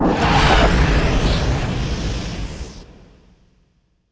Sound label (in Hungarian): Bomba; ImpGal2; spacewar weapons